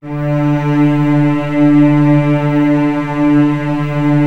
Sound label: Virtuoso Strings, L; ILIO; Kirk Hunter Virtuoso Strings VOL-2; 90 s Sample CDs